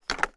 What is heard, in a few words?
phone handset down